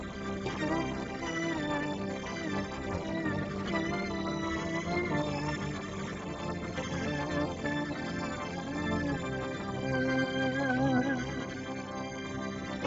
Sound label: Organ